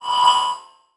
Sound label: Beep Electronic; Multimedia Internet CD-Rom Flash